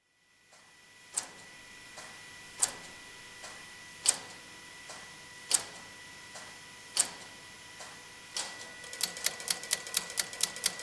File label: colossus performing series of calculation sequences